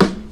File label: raw steel snare drum sample g key; snare drum samples